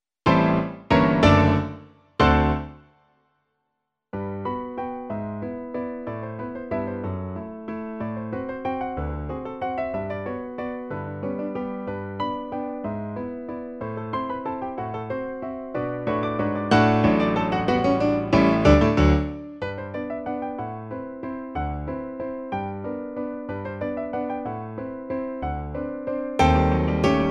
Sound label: Allegro non troppo; Thieriot